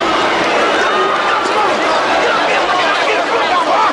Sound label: audience gasps